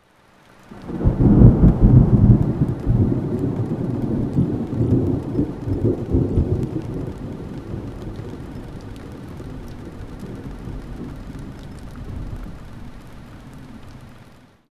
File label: thunder and rain in; 1919